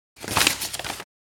Folding paper short

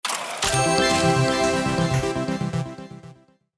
Slot machine casino bonus round end

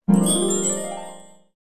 Spell of magic potion

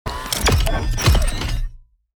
Sci-fi gadget gizmo cybernetic

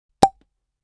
Champagne bottle cork popping

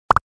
Cartoon accent popping

